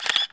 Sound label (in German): Kamera